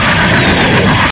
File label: artillery projectile, weapons; bf2